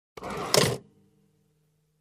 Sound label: Closing compartment